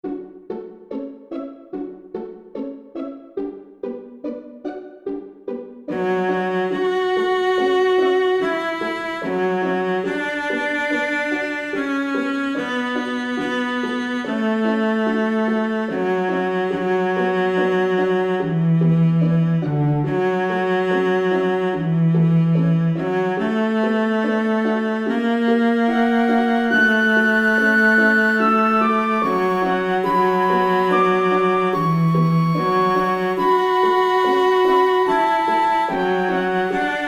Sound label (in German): Cello Konzert Satz